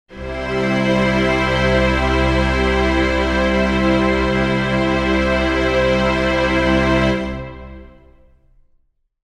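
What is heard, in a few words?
string chord c major, Pads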